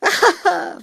chortle

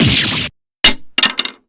damage; nayna

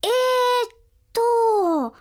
uhhh

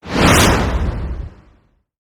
vanish

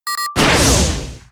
hand blast

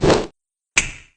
whoosh snap

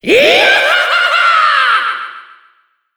evil laugh; Hazama

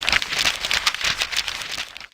book flip

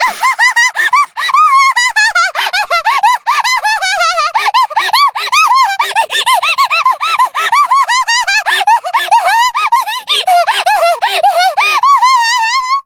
madlaugh

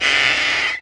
pull; sanmon

